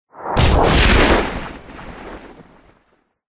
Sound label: sound design hit whoosh into punchy crunch hit with a rustling tail; zapsplat